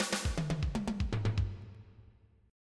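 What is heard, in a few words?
swing break, drum; Sounds Terrific II; Weird Science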